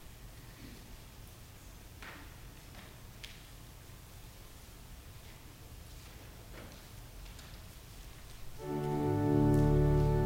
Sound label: Organ Music; 1 john 3 1 3